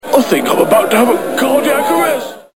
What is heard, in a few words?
cardiac